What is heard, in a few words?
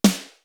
R8 PERC; Greytsounds VOL-1